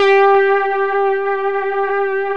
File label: VIOGUITAR; E-MU Formula 4000 Series Vol. 2